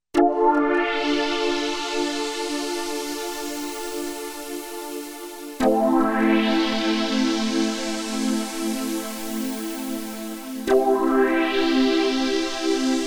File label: Polysix Pad